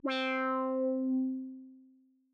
I Think Pluck

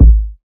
KICK

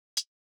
HH, Closed Hats

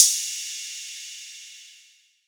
RIDE, Crashes & Cymbals

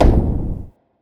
Perc

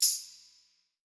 Shake

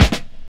Snare

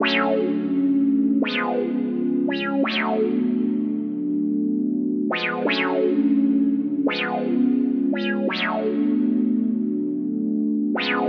170 Gb Minor

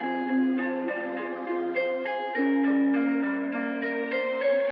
Cloud Samples bpm 102

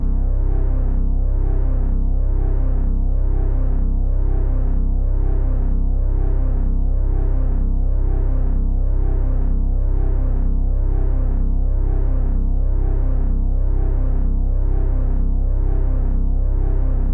Bass

